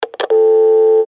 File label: modem